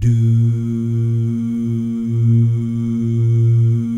"doo" guys, Vocal Jazz Fx

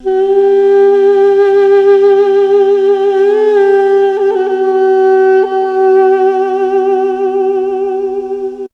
FLUTE; ZERO-G; Datafile

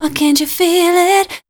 voice spectral, ly short

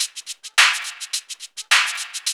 LOOP; E-Lab; X Static Goldmine 2; akai

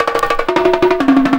DRMROLL; E-Lab; X Static Goldmine 2

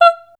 G5, Voice Spectral

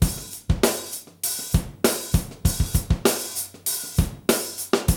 D3 BREAK

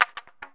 GTR RIF; INSTR LOP; E-Lab; X Static Goldmine 2; akai

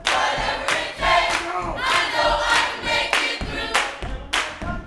Vocal Gospel PHRASE

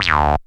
SYN TON; E-Lab; X Static Goldmine 2; akai

